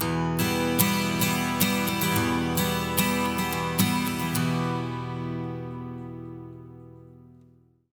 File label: MIN 12 S, Acoustic Guitar Loops; USB Soundscan vol.04 - Electric & Acoustic Guitar Loops; AKAI